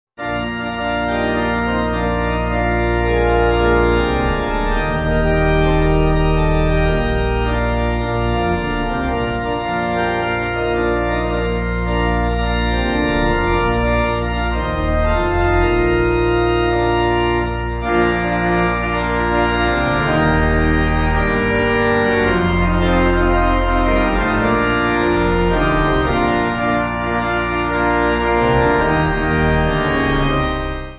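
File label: Pipe, Pipe Organ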